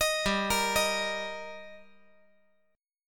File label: G Minor Sharp 5th G, chord